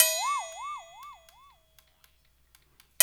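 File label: 80 bpm, Boing; Spectrasonics - Supreme Beats - World Dance; Ben Boing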